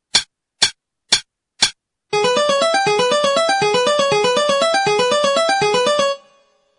Shred 120

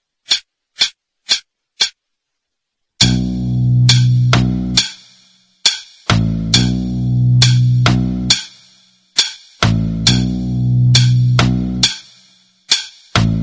Intro Backing 68

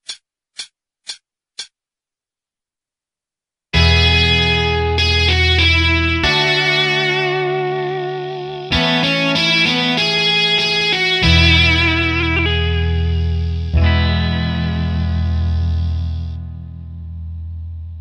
intro all 48

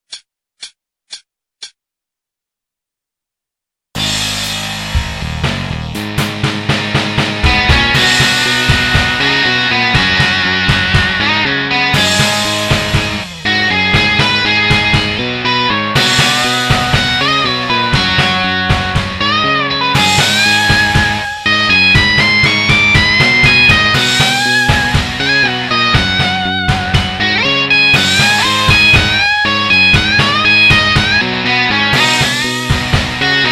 solo all 60

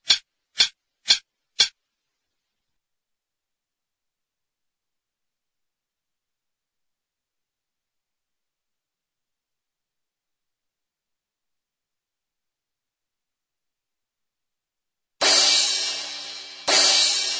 backing

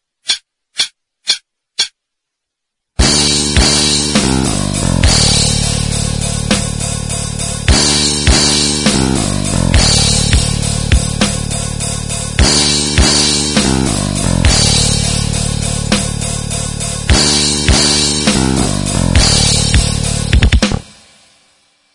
backing

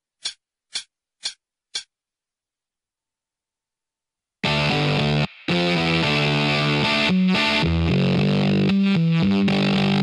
chorus 113

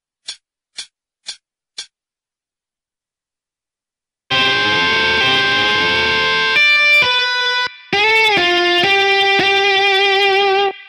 solo 66

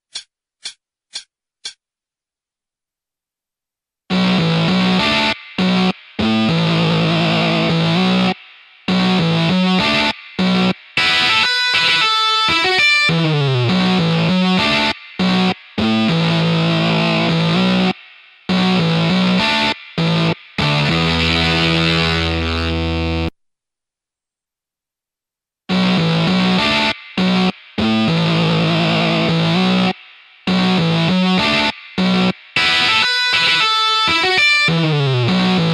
intro 100

